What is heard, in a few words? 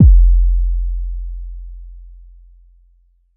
bass 808 eight F; Polyend; ARC Noise